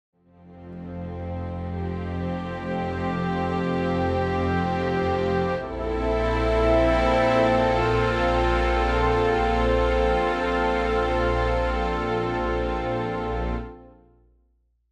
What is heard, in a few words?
Orchestra Dry